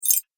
Robotic Clinks Ultra Fast, sci-fi robot sound effect; Sci-Fi Robot Sfx